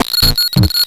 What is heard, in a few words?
grind, EMU XL-7